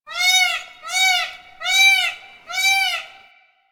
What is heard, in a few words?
Forest Bird Cries; Warframe; Ambience; Grineer Forest